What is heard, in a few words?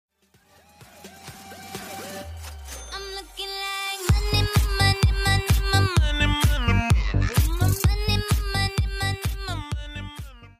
alert sound donation sound money; twitch